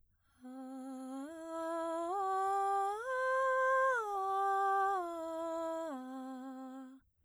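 arpeggios breathy a log sinusoidal, Female Singer performing a breathy arpeggio, Sinusoidal component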